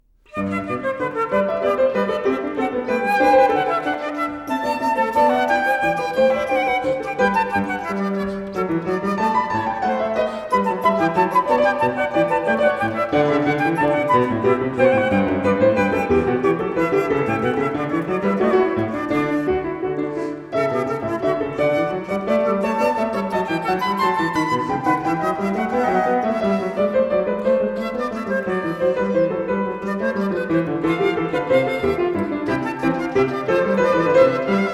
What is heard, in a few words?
Trio Sonata in G, Vivace, Played On Piano And Flute; J.S. Bach; BWV 1038 Anh.II 154-2